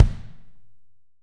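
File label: AMB KIK, ambient kick, studio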